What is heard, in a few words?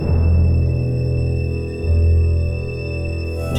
Vamp Loop to Continue; The Ladder - Vamp 02